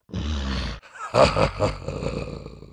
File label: growl; dota2; bloodseeker